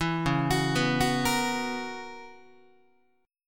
Db Minor 13th Db Guitar; Dbm13 arpeggiated; 7,9,9,9,10,9; JGuitar

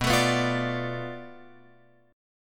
B-Minor 7th Flat 5th B down Guitar Open F; strummed

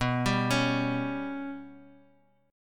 B Suspended 2nd Sharp 5th down Guitar Open D

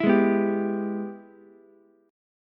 Ab Minor Major 7th Sharp 5th chord, Ukulele; strummed; JGuitar

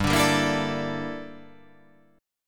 G Minor 6th Add 9th G down

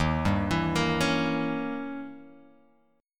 Eb 7th Flat 9th, Eb, Guitar, Open F; 3,2,4,5,4,x; Eb7b9 arpeggiated; JGuitar

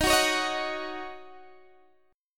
D sharp Minor 7th, Guitar, DADGAD

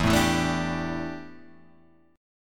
D Suspended 2nd Suspended 4th E

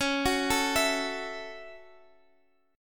A 6th C sharp, Chord

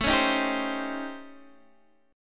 A 7th Sharp 9th Flat 5th, Guitar, Open D; x,12,11,9,10,10

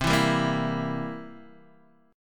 C 7th C down Guitar Open D

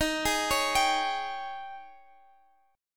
Ab Major 7th Suspended 4th Eb

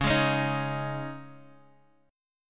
C sharp Suspended 2nd, Guitar, Open D; 11,11,11,9,x,x

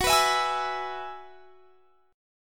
F sharp 9th Suspended 4th F sharp, chord; F#9sus4 Chord; strummed; x,21,21,21,21,24

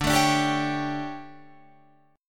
D Major 7th Suspended 4th Guitar Drop D, chord

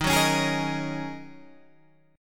D sharp Minor 11th D sharp Guitar Open G, chord

